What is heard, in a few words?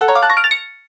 Alarm Xtra Slow; Samsung SGH-i600